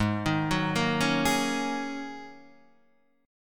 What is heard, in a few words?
G sharp 9th Suspended 4th, Guitar, Open D; 6,6,4,4,4,6; down; chord shape